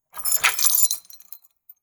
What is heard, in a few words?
keys unlocking door; soundscrate